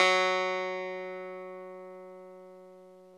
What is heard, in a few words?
CLAVINET F#3; Big Fish Audio - First Call Vintage Keyboards